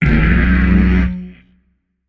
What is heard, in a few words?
tongue, voicepack; smite; jorm